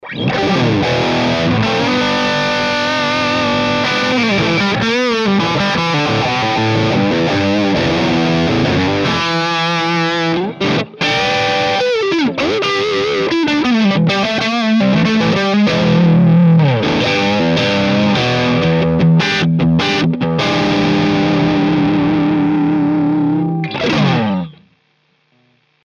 Digitale Gitarrensounds Orange Rockerverb 50 MK II; Amplitube 5

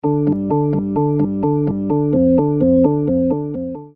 Orgel

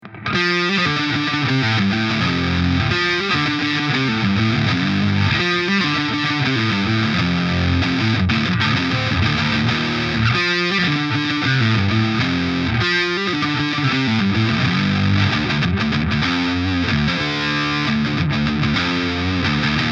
Solar Guitars GC1.6 Killertone Hals seriell

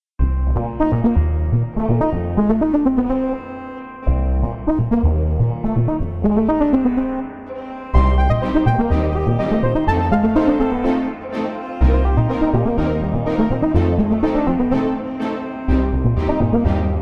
Behringer Kobol mit Euro

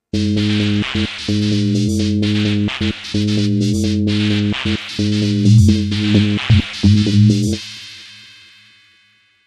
FM Sequenz

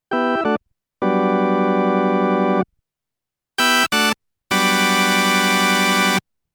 Vox Continental Orgel Compact; MTB

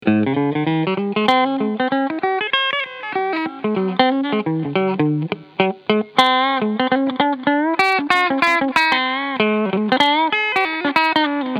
Harley Benton SC Junior LTD Cleansound Pickings

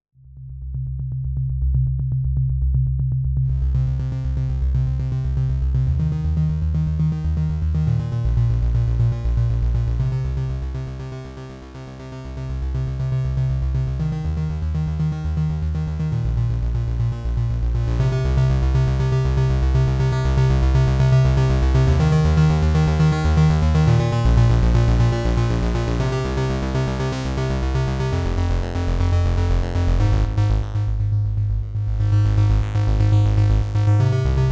PX5S Hex Layer mit Arp

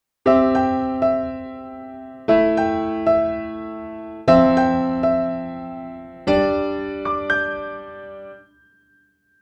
Korg SDD 3000 Piano ohne Effekt; UAD

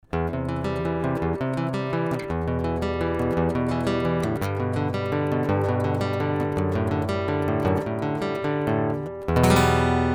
Akustik Git Big Amp Piezo

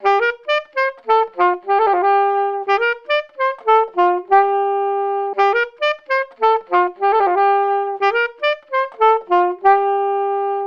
Sony DRE 2000 Saxophon Dry

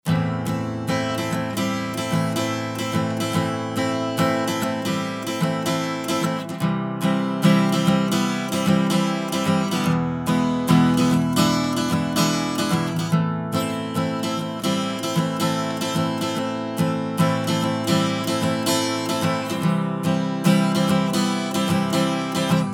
Western Gitarre EQ 12; Bettermaker EQ232P MKII

